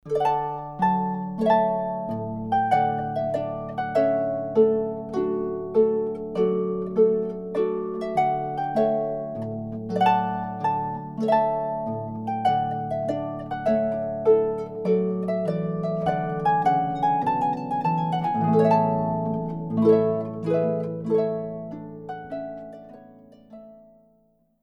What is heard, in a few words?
Trumpet Tune, harp